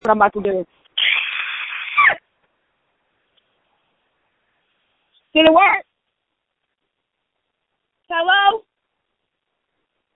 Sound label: screams